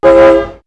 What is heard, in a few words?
Horn; X-Static Goldmine